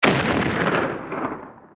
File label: Bullet Bill